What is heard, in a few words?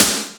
Snare, retro house samples, Drum Hits